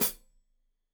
Hat Foot, Hi-Hat, Cymbals Stereo

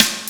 SD2; Crypton - DrumBase5000; DANCE3+TB303

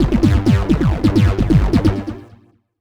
Tech Bass; Dr. Fink's Funk Factory

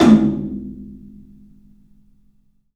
TOM, Low Toms, 3D Percussives; E-MU Producer Series Vol. 5 – 3-D Audio Collection

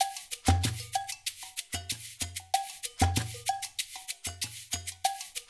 LOOP, Percussion, FUNK; InVision Interactive; Percussion Slam

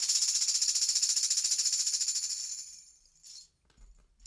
Raw Funk Tambourine, 70s Analog Funk; Sonic Foundry (Sony Creative Software); What it Is! 70s Analog Funk

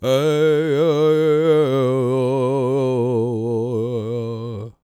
World Voices, CHOCTAW; Spectrasonics Vocal Planet CD5

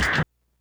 hihat; Big Fish Audio; Hardcore Breakbeat Action; Funk Factory

